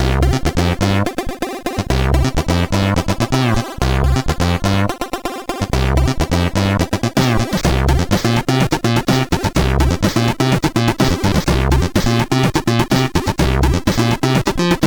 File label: Ode to, CSG8580R5; hvsc